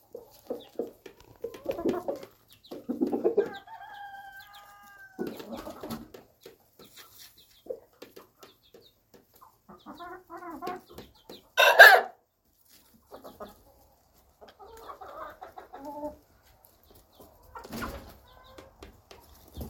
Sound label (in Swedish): Höns